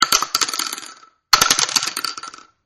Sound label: Multiple Shotgun Bullet Shells Drops And Bouncing On The Tile Floor; Shotgun Shelldrop Multi; Shotgun Sound Effects